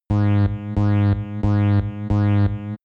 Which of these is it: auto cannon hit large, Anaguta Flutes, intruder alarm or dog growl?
intruder alarm